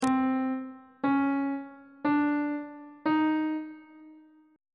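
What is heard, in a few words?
d sharp diminished 7 minor 7 major 7 augmented 7 intervals on bass clef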